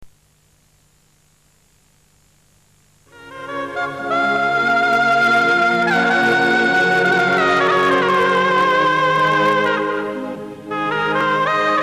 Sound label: Instrumental - Shehnai; Bangla